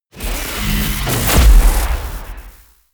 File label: disintegrate